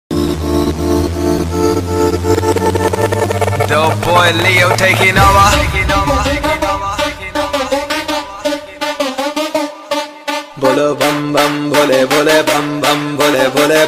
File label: Shiv Bhajan